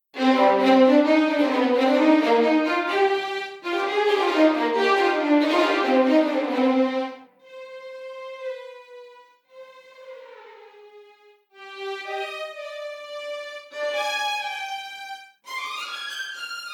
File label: First Violins Test Main; LSS